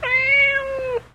cat meow

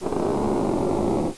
lofi door creak

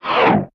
metal scratch

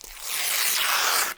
paper rip

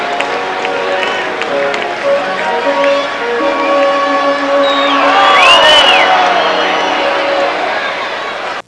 CROWD

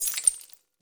glass sweep

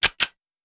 glock fast reload

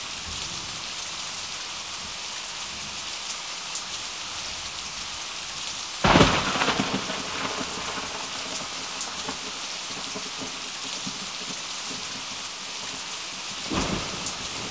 thunder heavy rain

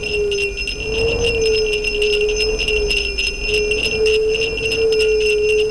JINGLE

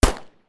9mm smg shot

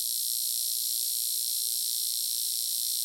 eelectric hum

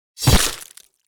sword stab flesh

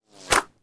bullet hits body

